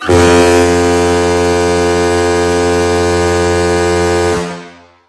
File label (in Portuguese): buzina maritima